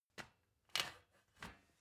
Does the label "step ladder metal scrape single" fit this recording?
Yes